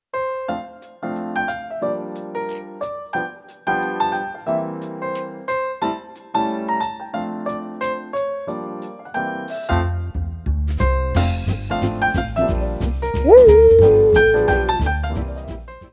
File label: Piano Santa